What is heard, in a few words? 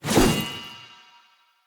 Long Sword Throw Perfect; Weapons; Player; Pothia Long Sword; Swords